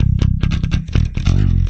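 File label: 141 bpm fingery flubby bass, bass loops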